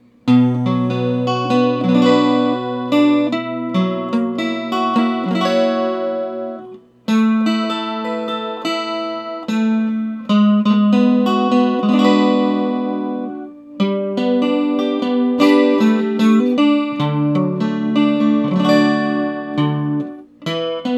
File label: Guild G300 Taylor, guitar, amplifiers